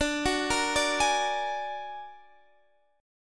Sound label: Bb Augmented 7th D